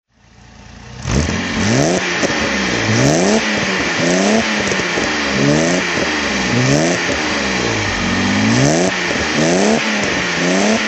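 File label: mercedes-benz 280sl auto throttle; Mercedes-Benz 280 SL 'Pagoda', 1970; Engine sound; V3D/ ClassicarImages; Marc Vorgers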